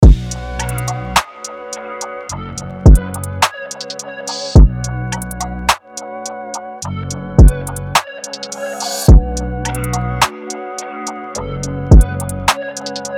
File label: 106 BPM Bm; UNISON DEMO; Here 444 You